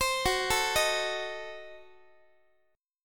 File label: F sharp Diminished 7th, Guitar; F#dim7 arpeggiated; x,x,15,16,13,14; 4A,4E,5A,5D,5G#,6C#; chord shape